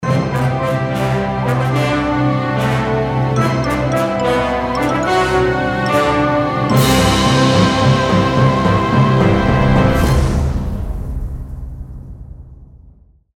Jingle Ball Collected; Time Piece

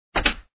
SFX Item Pickup; bin laden liquors 2 2001 gamerip online

